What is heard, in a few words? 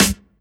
Snare; Takebeat; Club; VEC1